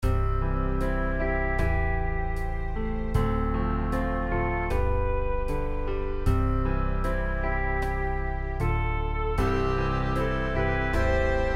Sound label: instrumental; New Hope Music